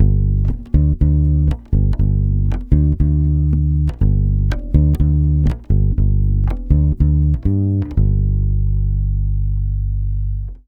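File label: 120 BASS G minor 7, Brazil Bossa; USB Soundscan; AKAI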